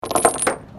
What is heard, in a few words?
chain rusty drop